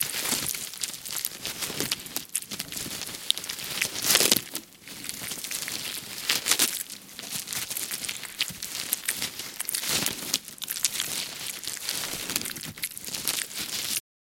small octopus; osminoga

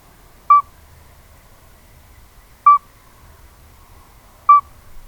midwife toad alytes obstetricans; lyagushek i zhab

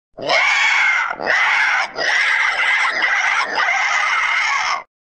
pterosaur weird calling

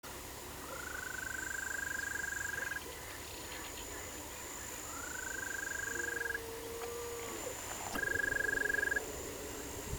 swat green toad bufotes pseudoraddei; lyagushek i zhab

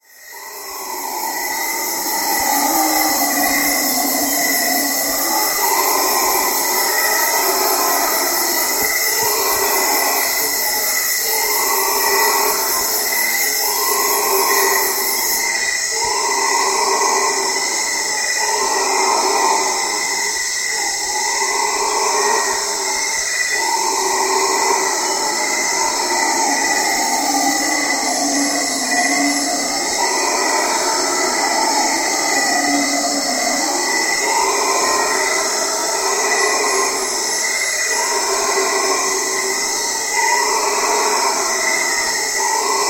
guianan red howler alouatta macconnelli; revuna obezyany

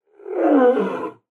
lion growl strained; rychaniya lva

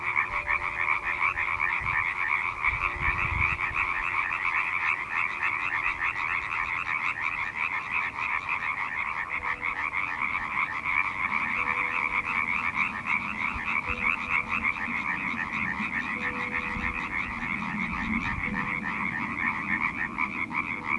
common tree frog hyla arborea; lyagushek i zhab

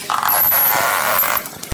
crackling screaming and beluga squeak

